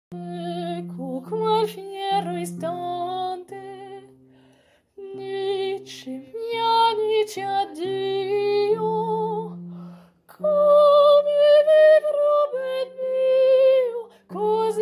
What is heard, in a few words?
voix 1; Ecco quel fiero istante